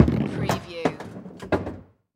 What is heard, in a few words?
Ski Ball Game Roll; sound effects bible